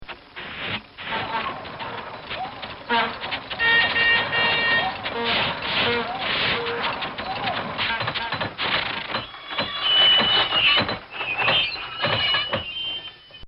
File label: Comical Sound Series Traffic Jam; Funny Sounds